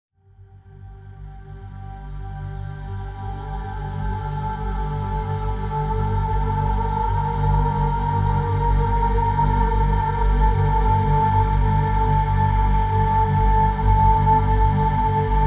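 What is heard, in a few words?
vocal atmosphere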